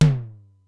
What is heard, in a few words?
Simmons SDS-9 drum machine; Factory C1